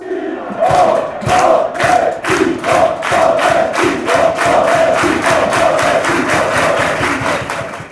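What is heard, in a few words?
fanchants, football chants; doletico; Real Zaragoza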